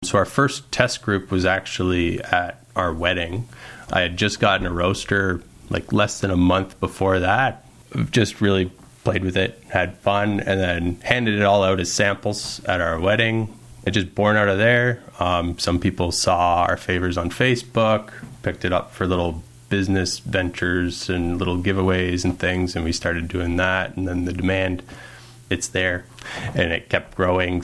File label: belch